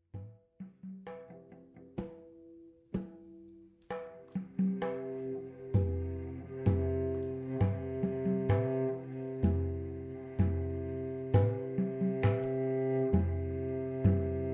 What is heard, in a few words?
cello tabla